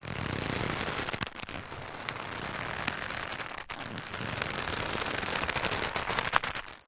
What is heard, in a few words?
foley rope old thick movement twist stress creak; zapsplat